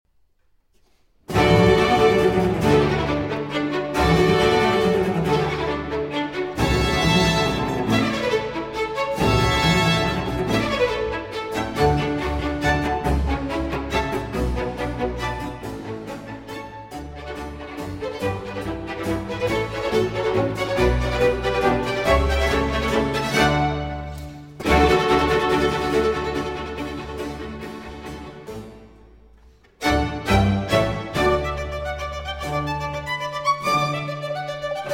Concerto in D Major For 2 Violins, Strings & Continuo; RV 511 3. Allegro